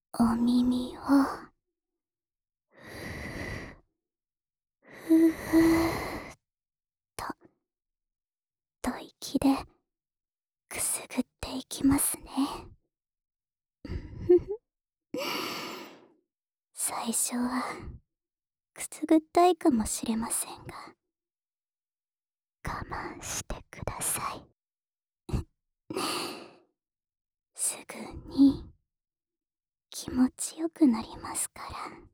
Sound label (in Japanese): 耳吹き 右, ASMR